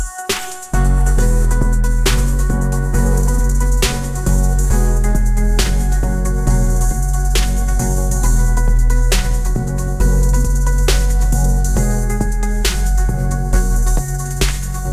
electronic dance music

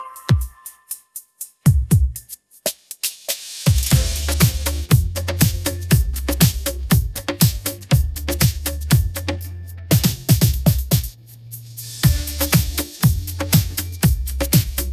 upbeat pop melody; ai

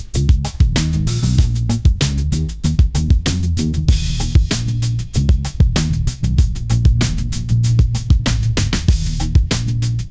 funky bass line, ringtones; ai

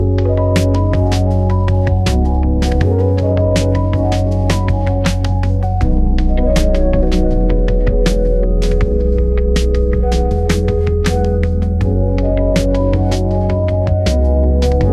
lo fi hip hop

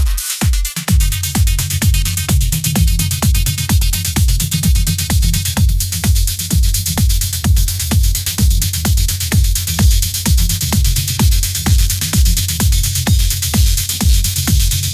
electronic dance music

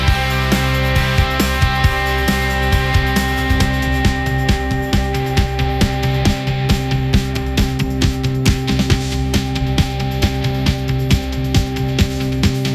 energetic rock; ai

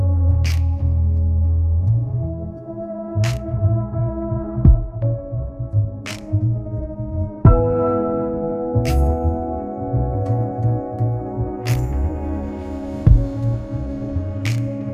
lo fi hip hop mellow piano, ringtones

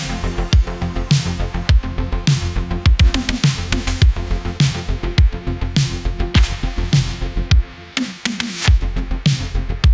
retro 80s dark synthwave

